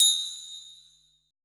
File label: HI BELL, PERC; BPC PERC